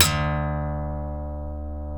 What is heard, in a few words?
E, funk guitars